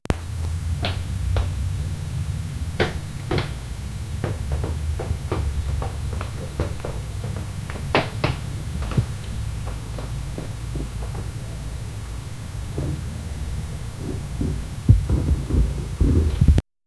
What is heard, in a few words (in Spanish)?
ruinas cayendo lentamente, natural disaster